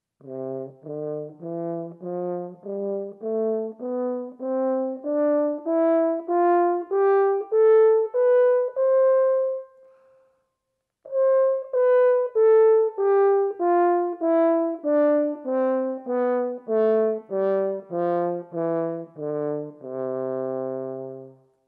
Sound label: French horn; ساز هورن